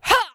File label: jump, players female; warfork